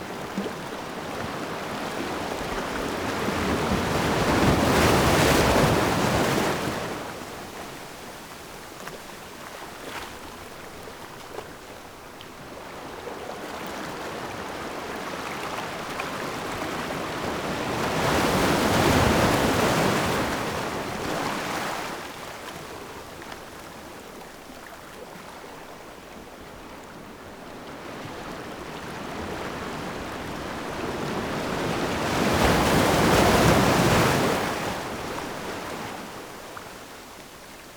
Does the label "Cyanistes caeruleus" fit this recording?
No